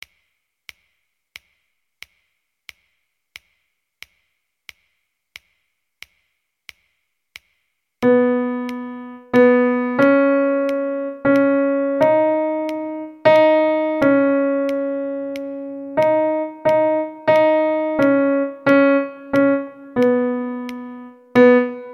Melodic Dictation 12 8

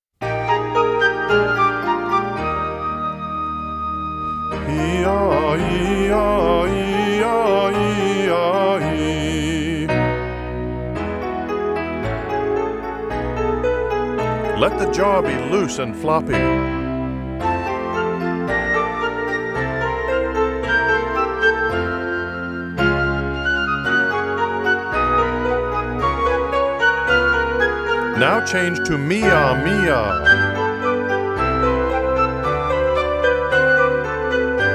Hee Ah Hee Ah Mee Ah Bee Ah Hee Ah

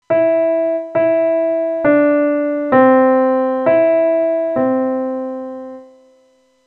Melodic Dictation Pitches Only

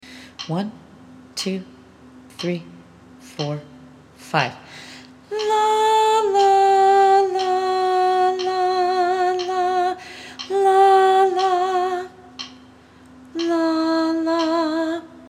Melodic Dictation

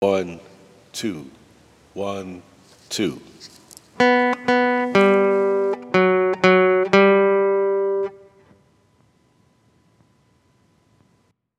Melodic Dictation

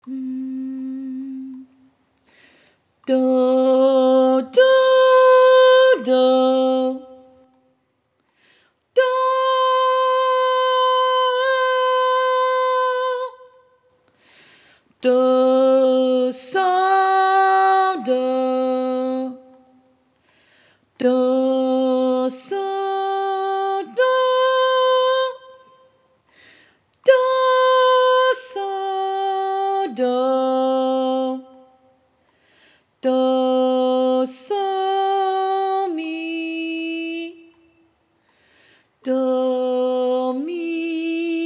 Intonation in C top starting note

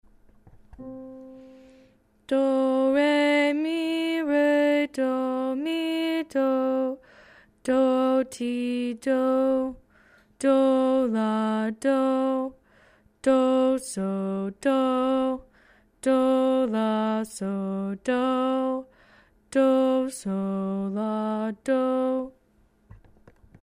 Centering Song

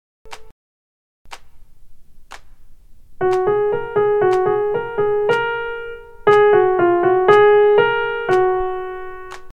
Melodic Dictation

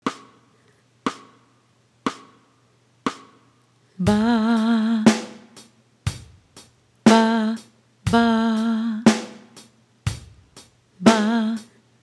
Ba Ba for Singers

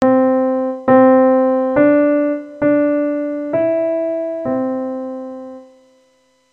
Melodic Dictation Pitches Only

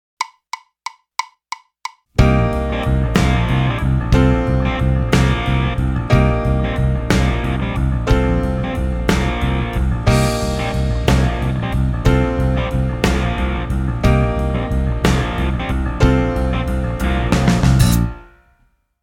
Three Four Blues Based Minor

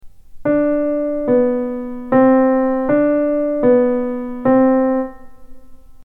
pitches only

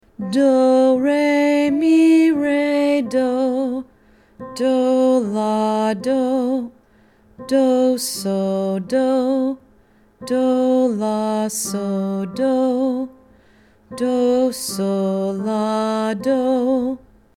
Centering Song

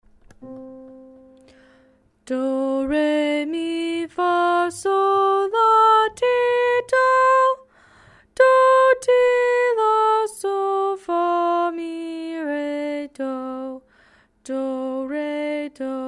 Centering Song

